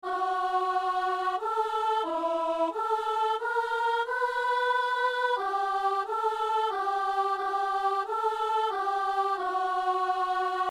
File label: alto